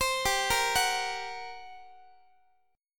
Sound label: G Minor Major 7th Double Flat 5th, G, down, Guitar, 4A 4E 5A 5D 5G sharp 6C sharp; GmM7bb5; arpeggiated